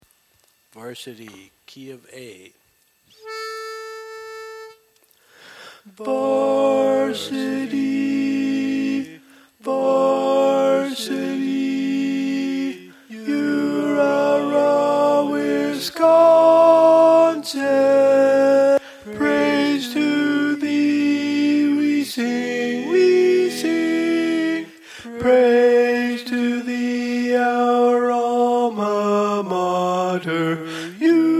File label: Wisconsin Varsity Tenor